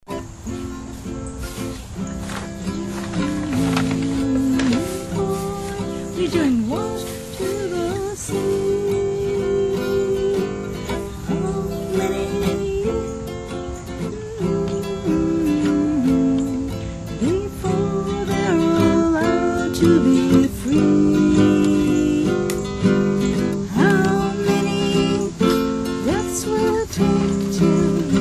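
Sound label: guitar, field recording; cuyfalls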